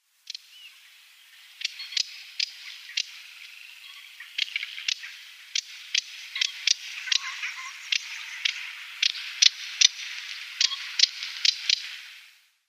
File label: Moustached Warbler calls